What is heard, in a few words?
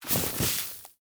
sand animal dig